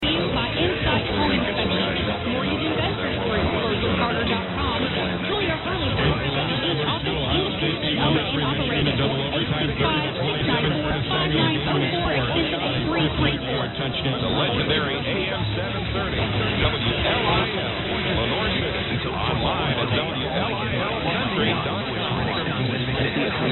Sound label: Lenoir City TN; 730 WLIL